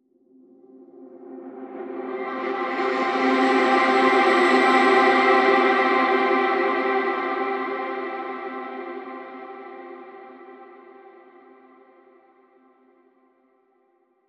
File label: Eccentric Ethereal Transition